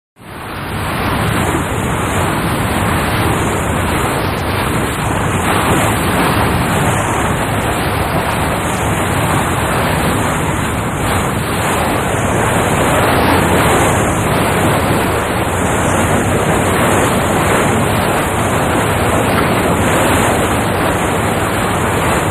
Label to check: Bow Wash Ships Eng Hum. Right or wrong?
Right